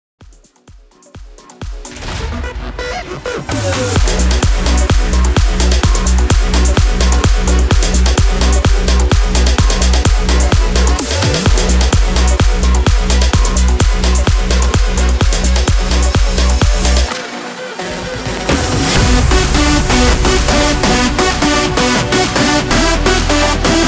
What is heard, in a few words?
EDM 128 BPM